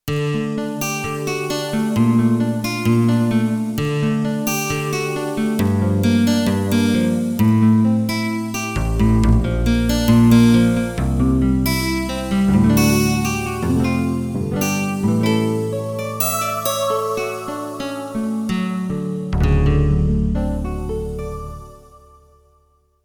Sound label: Nylon Choir, Roland JD-800; Factory Patches